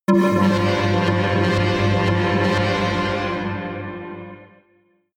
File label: synth hit tube high, Hits/Impacts; whooshes rises